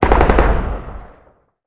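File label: weapon aa gun firing distant short; Explosions; Call of Duty Modern Warfare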